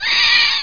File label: wasp; PwrSlave